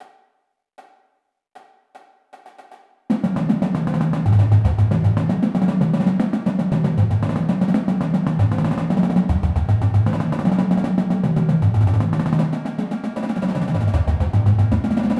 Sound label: Triplet Rolls; Bluecoats; diddles rolls